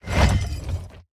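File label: movement armor heavy; smite; god atlas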